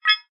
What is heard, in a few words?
multimedia notification short digital futuristic beep generic